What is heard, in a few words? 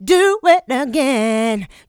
mixed vocals; Houseworx